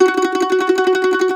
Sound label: MAND TRM, Mandolin; E-MU Producer Series Vol. 7 – Old World Instruments